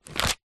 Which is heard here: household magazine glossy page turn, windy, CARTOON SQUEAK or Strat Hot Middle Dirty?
household magazine glossy page turn